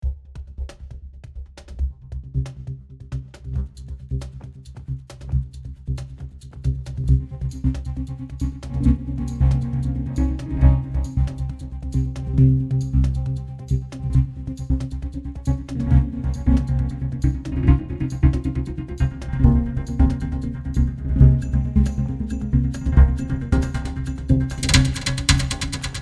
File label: Perc